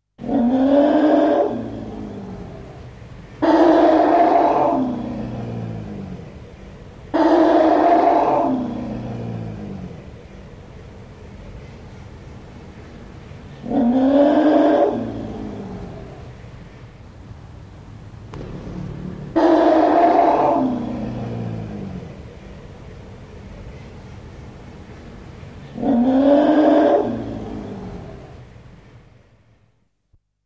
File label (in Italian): RUGGITO